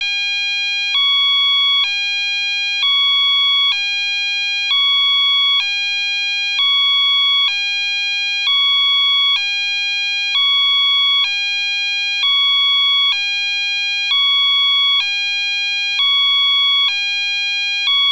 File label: DUAL TONE, Piezo Siren